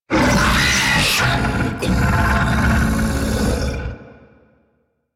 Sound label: Octopede Attack; Warframe